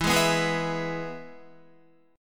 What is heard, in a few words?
E-Major E, Guitar; strummed; JGuitar